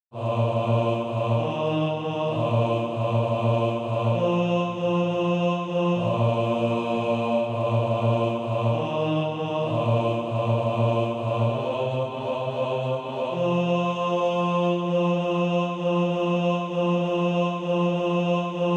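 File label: bass, SATB Practise Music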